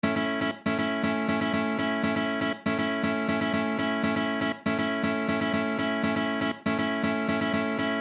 Rhythm, guitar

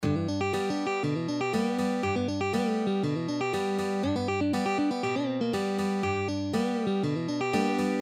Country Licks Country Bending Solo, guitar

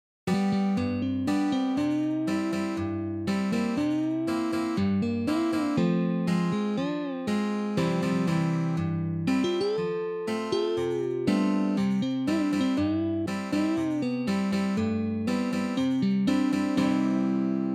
Country Licks Country Bending Solo, guitar; Arlen Roth

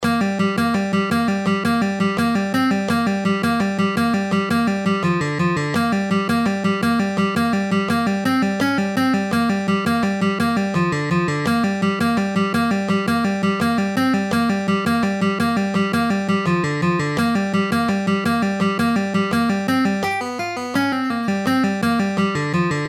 Guitar Dynamics Half Speed

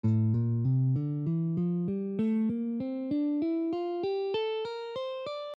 A Phrygian, guitar

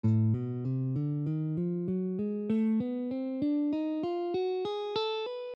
A Diminished

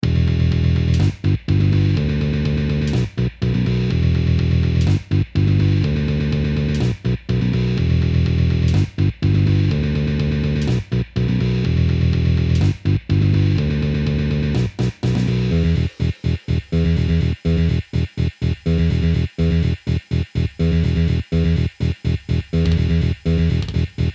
Riff

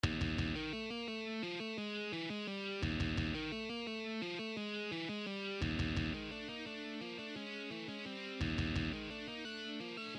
guitar

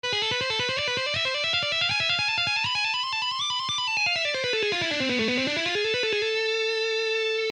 Shredding Exercise

